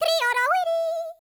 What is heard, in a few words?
YODEL, World Voices